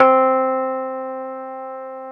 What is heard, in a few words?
Keyboards Old School, C3; USB Soundscan vol.09; AKAI